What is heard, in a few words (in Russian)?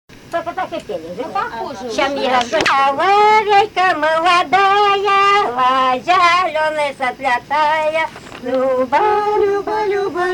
Соловейка молодая во зяленый сад лятая, Свадебные песни; Псковской области; Объекты нематериального культурного наследия Псковской области